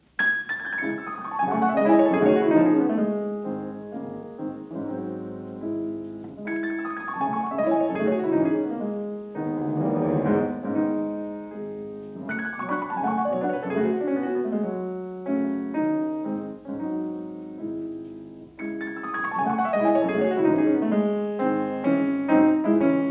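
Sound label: Preludes in C sharp minor, piano; CHOPIN; OP28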